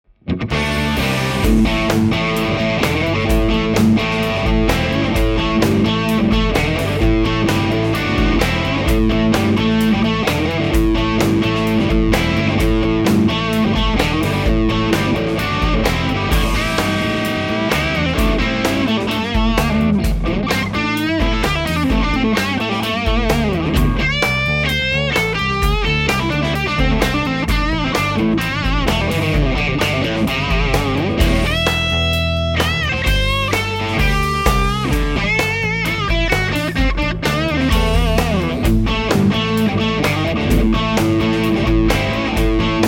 Dist Bridge, Strat; schwarzbach; VP-Strat-IrishTour

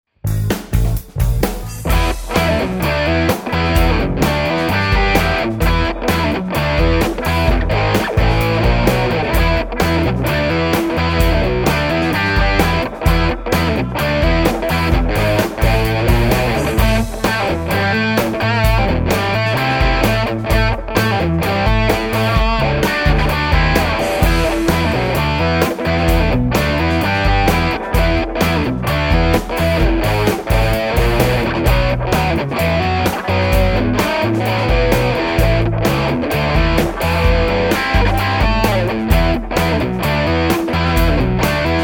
more gain; schwarzbach; RedZoneH

